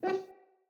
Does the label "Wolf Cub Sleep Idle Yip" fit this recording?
Yes